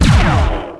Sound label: Heavy Laser; X-COM; SpaceFlight